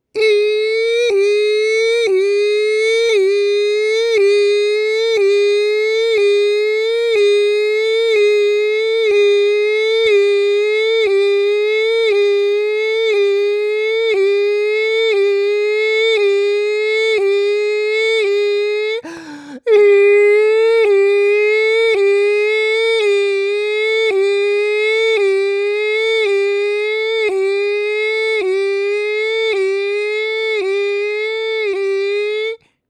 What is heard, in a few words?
Car Alarm